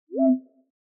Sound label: terminal Command Succeeded; accessibility signal